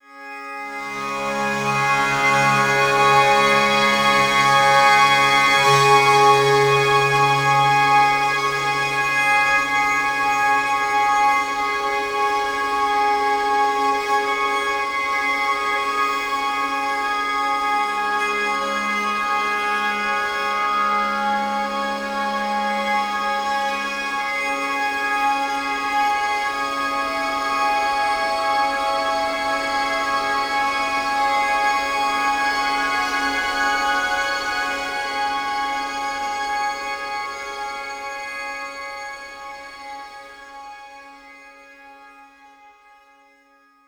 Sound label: Death Stinger